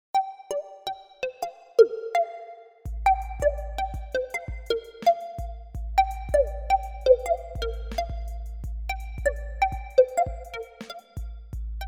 instrumental

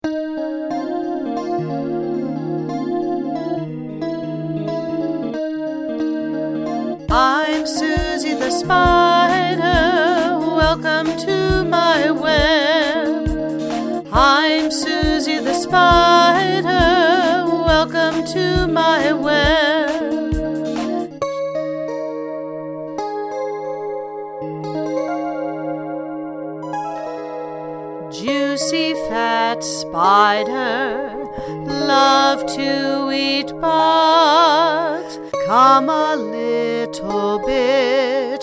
Susie Spider vocals

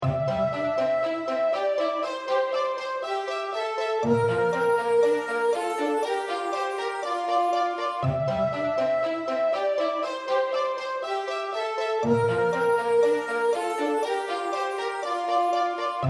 song instrumental

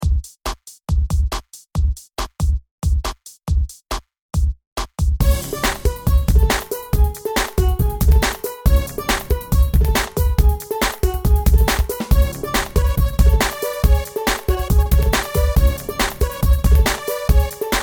instrumental

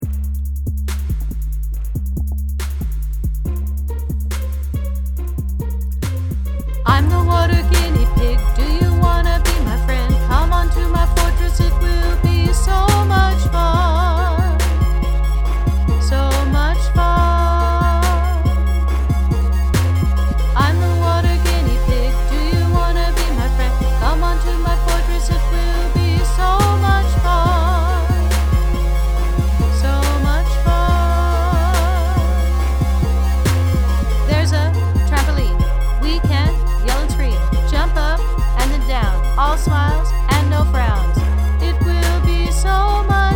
vocals

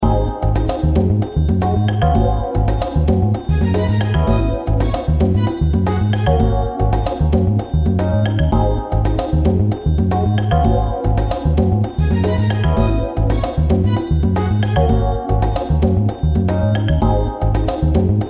instrumental

